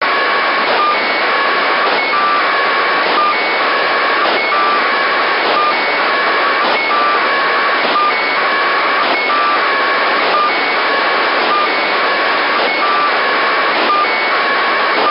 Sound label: seeds packet; This packet radio transmission was recorded on 437.485 MHz on May15th 2008 at 12:20h UTC